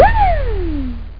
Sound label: wheeee